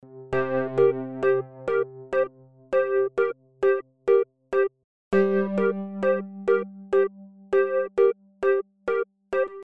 e piano loops

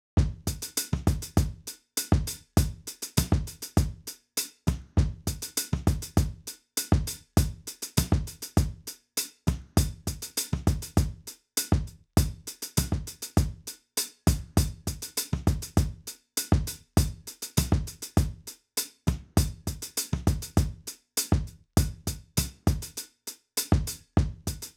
Drums

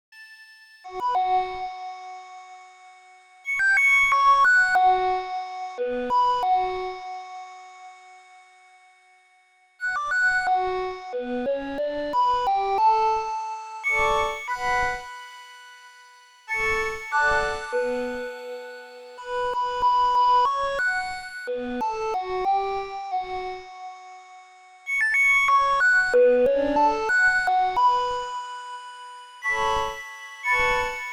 90 Synth

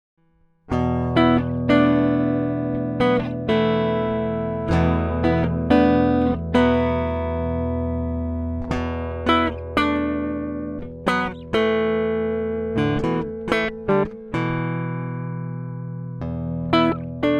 GTR RIFF dry

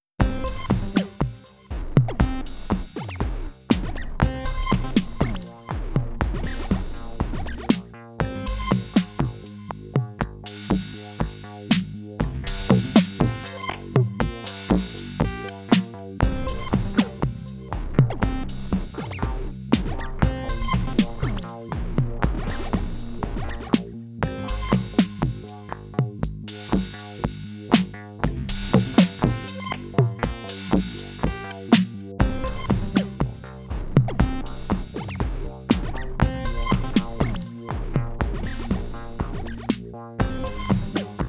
rhythm

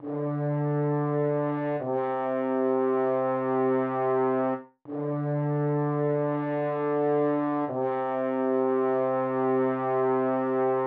French Horns

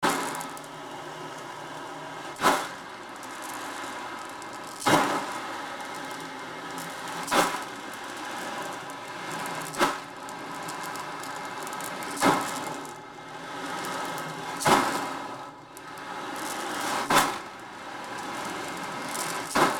Ocean Drum

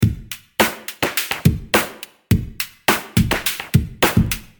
Beats and Effects

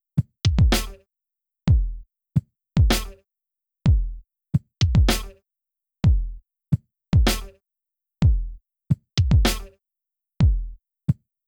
drums

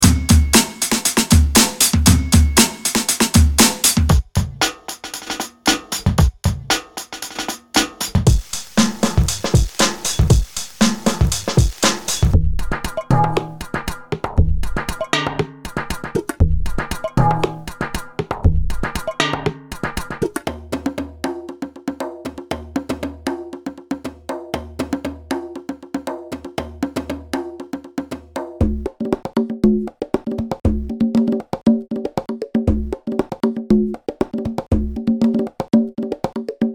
Beats

